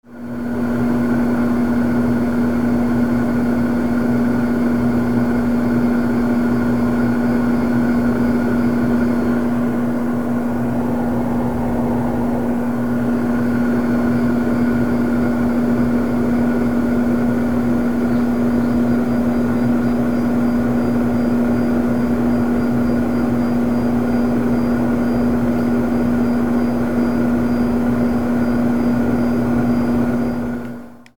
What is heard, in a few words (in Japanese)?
kankisen